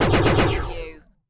Laser Cannon Four Shot; Sound Effects Bible

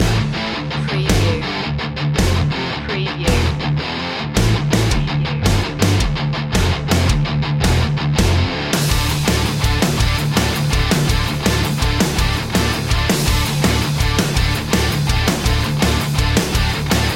battle ready loop

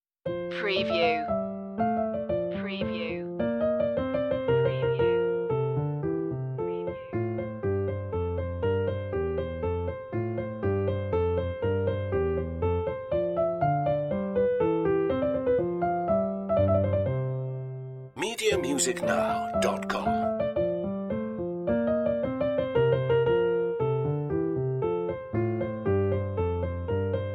bach menuet f major; notebooks annamagdalena bach; bwv anh113

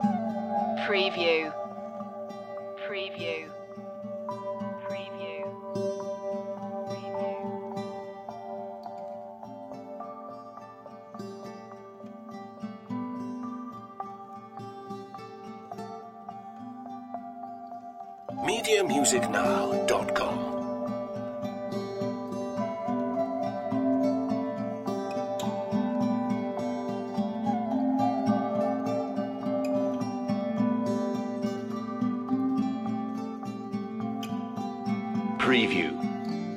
safari lite loop

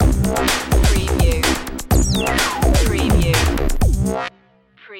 acid drop

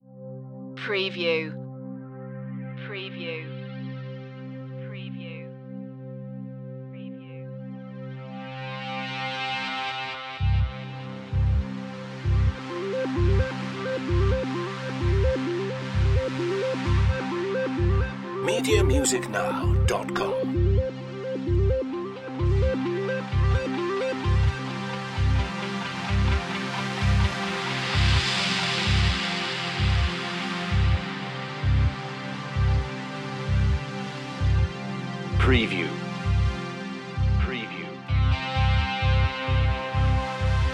electro driver minimal ambient mix

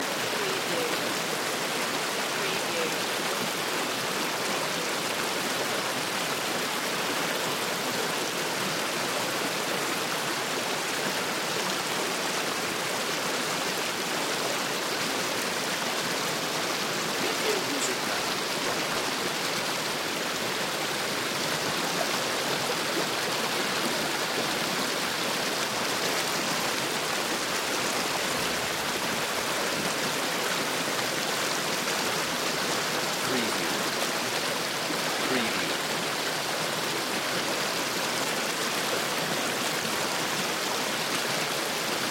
River or Stream Medium

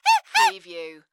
Monkey Chimpanzee

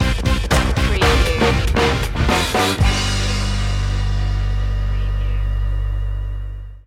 funky bag stinger

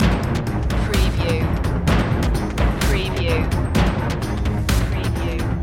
black heart loop

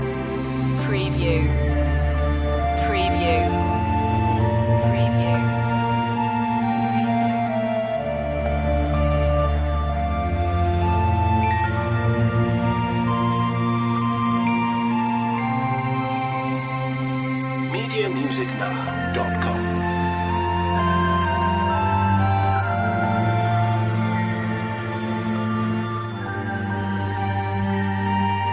ambient clouds loop

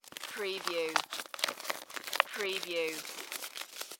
Newspaper Roll Up

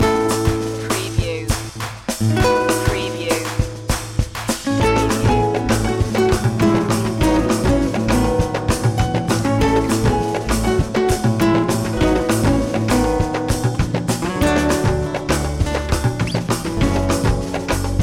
with guitars

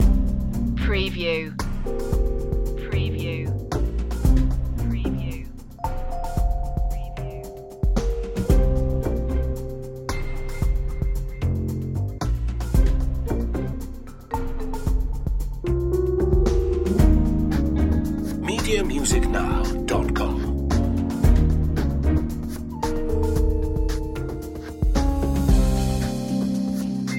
corporate adventures variation

